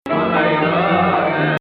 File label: Rast Hijaz